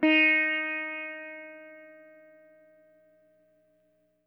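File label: electric sitar soft; Quantum Leap - Guitar & Bass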